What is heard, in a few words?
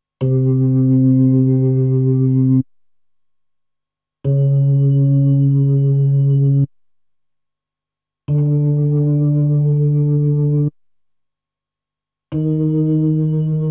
B3 Organ; Masterbits CLIMAX 8